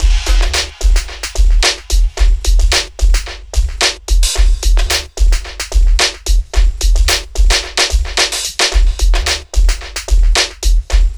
junk hip hop 110bpm

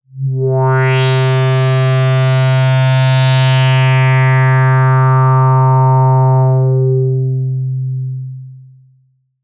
pad C3, ARP Odyssey; Polyend; Simulacrum Sounds; 1 Shots; Synths; Pads

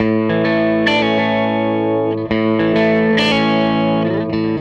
Gretsch Guitar Loop

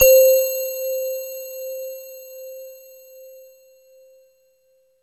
JV bell, JV-1080; Syntec; Wall of Sounds VOL-2; BASIC-JV1080

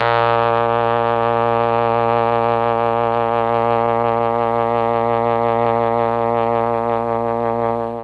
A#1 TRMB TRMP, Mellotron; Mike Pinder - Mellotron Archive CD; TROMB+TRMPT

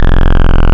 Sub Phat OD Pulse, wavetables; Animoog

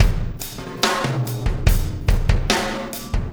Impact Crater Beat, Processed Drumkits

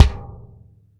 BD, Bassdrums, Roland TD-20

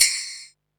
HAT, Techno 138 BPM

